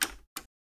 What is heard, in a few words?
Sound of pressing the button of the radio cassette player